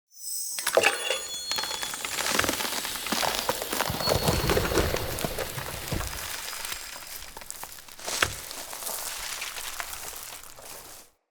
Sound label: Plant Grow; Cinematics; Bromius; Bromius Quest End Bond